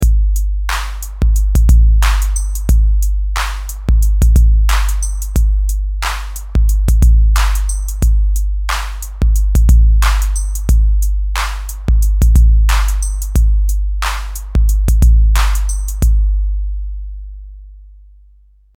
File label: 808 clone